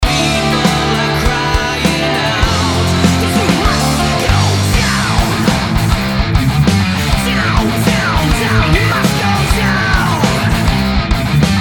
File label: Axe